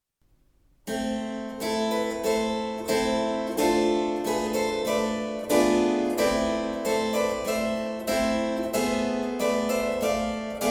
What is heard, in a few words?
Bb minor wt; owt tuning examples; Bb Minor Wtc